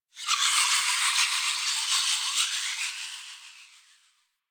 Gas City Distant Textures, Ambience; Warframe; Corpus Gas City

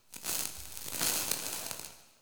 Invis Rune Active One Off; Powers; Runes

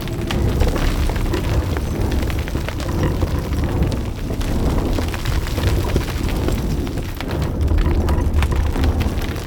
Stone Coffin Loop; Puzzles

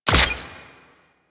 Hunter Crafting Equip, UI; Warframe; Cetus

